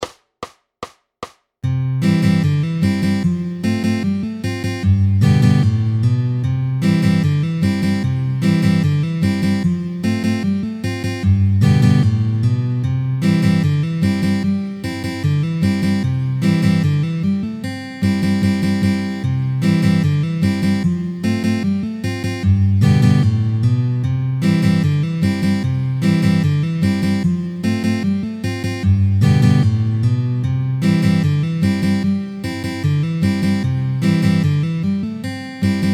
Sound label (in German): Country Doodle C Dur; Bluenote